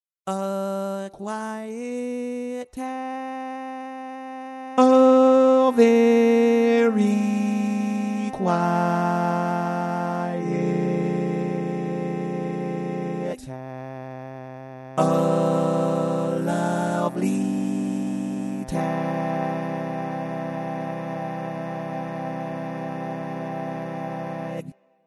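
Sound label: Quiet Tag Baritone, barbershop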